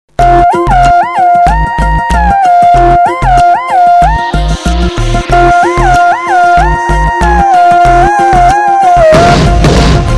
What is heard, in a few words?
Best Hip Hop